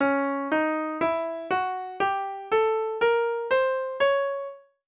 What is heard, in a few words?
C sharp dim; First Steps